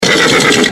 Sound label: pony